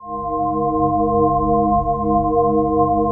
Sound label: PAD, ATMO PADS; Best Service; XX Large